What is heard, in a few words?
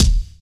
clicky kick single shot E key; kick drum samples